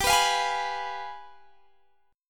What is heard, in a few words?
G 7th Flat 9th, Guitar, Standard